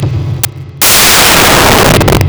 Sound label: sound window close; BrushedMetal-Tigert